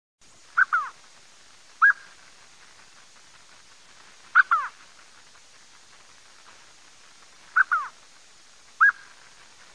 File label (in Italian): GRANDULE DEL SENEGAL